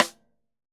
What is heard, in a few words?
Rimshot; Best Service; Festa Latina VOL-1; Single-Hits